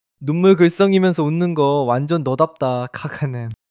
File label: laughter